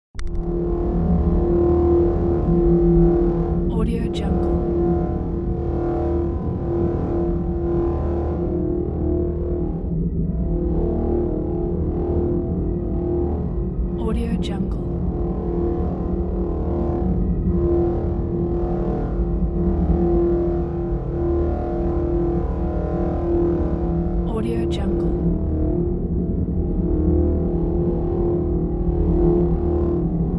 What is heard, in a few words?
enigmatic background